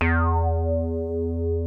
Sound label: JUNO 60 E4; Hollywood Edge - Giorgio Moroder Rare Synthesizer Collection